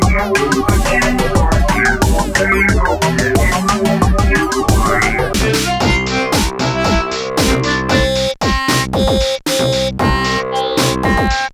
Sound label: garble song snips